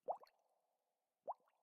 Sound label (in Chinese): 水下气泡声; ambient